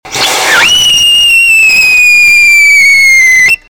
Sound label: whistle tests copper sal, rockets